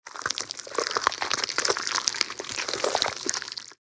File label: Flesh gore and blood movement